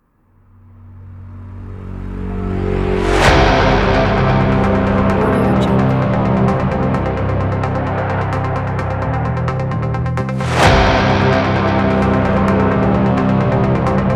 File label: epic futuristic aggressive trailer